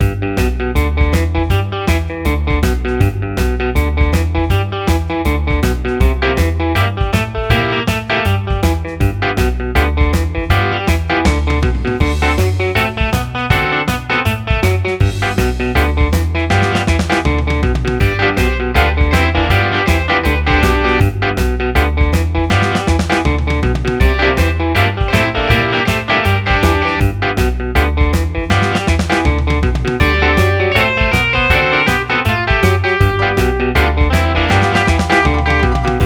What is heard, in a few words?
50s rocknroll